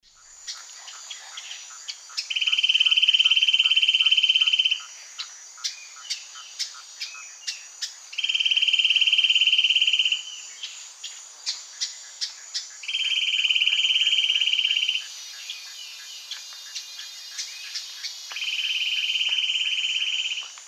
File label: Ramphocaenus melanurus, song and calls; S. America; Eastern Lowlands